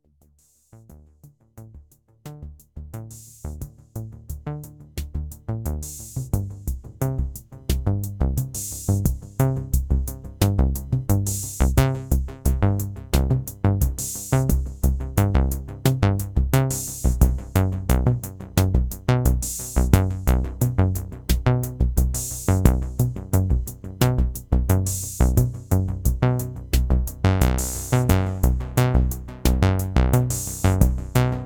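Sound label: TR808 Simple Sequence; RetroWave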